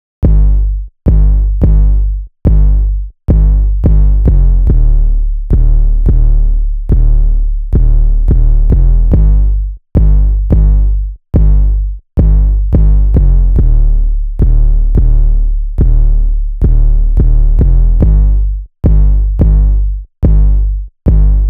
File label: another take bass